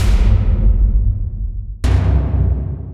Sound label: Retro Cinematic Drums